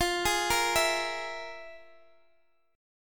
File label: F Minor Major 7th Double Flat 5th, F, Guitar, Open D; x,x,15,14,13,14